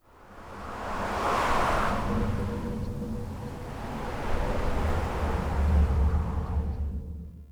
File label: Cave Ocean Wind Gust; Ambience; Midrath Island